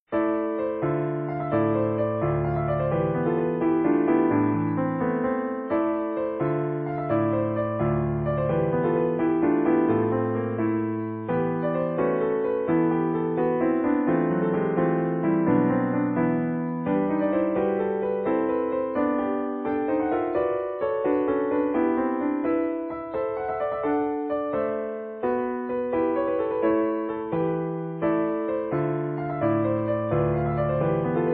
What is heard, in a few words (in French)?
Bouree; Miaille; St-Come